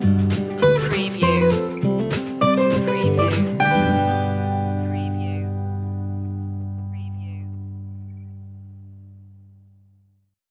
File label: spring air stinger